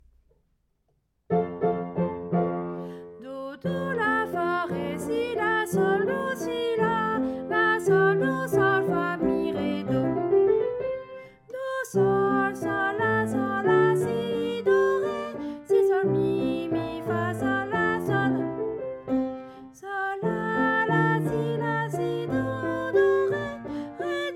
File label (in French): voix; Mozart